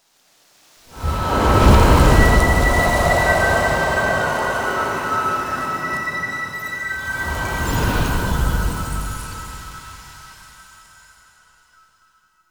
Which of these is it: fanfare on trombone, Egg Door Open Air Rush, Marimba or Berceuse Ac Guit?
Egg Door Open Air Rush